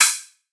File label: drum hat half open; Virtual Riot; Drum Hits